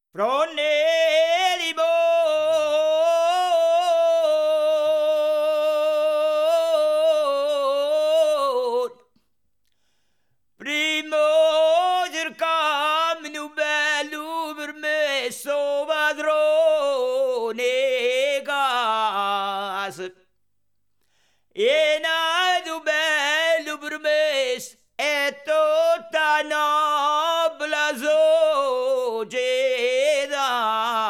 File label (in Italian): Tammurriata; Fronna e Limone; TAMMURRIATA DI SCAFATI